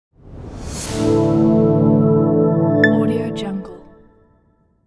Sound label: bright airy logo reveal